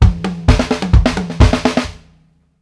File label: STRGHT KICK, drumworks fills; Sampleheads; New York City Drumworks VOL-1; KD FILLS 120